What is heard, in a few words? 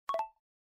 Lock; Gionee; S10